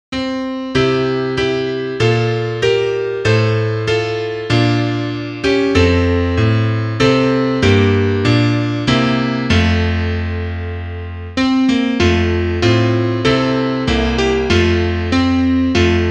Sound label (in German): Klavier; feierabendlied